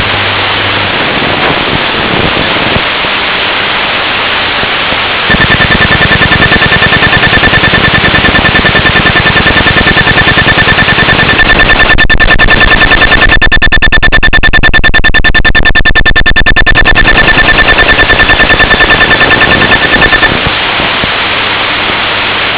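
keyfob, Scan samples of different Transmitter types; Car central locking key fob transmitter; an example of a burst transmitter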